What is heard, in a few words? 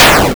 explosion; RocketPatrolMods